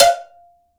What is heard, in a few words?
cowbell; AKAI S6000 CD-ROM - Volume 5